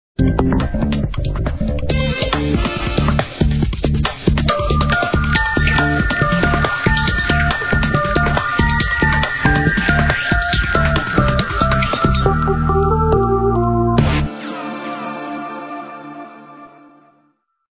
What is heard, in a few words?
ringtone techno; trollz